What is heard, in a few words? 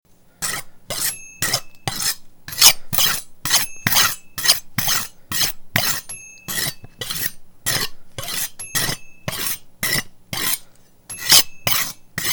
Chef Sharpening Knife